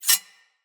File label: Sword Slice Accent; Weapons; Player; Garren Sword